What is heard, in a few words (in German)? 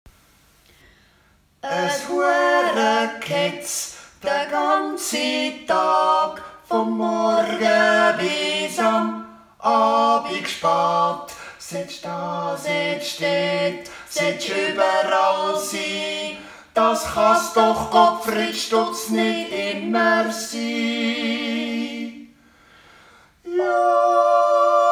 Jodel und Solo